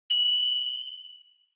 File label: Flash Low Short; Onoma; オノマトペ 光る; 低 短